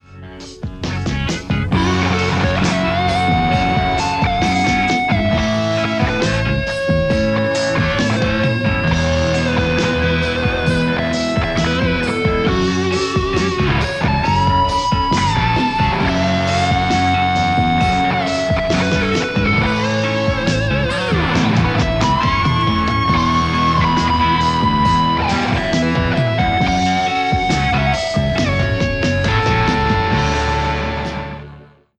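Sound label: Telecaster; rcvprod amplistortion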